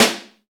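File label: snare, Naturals; Club-50 - Foundations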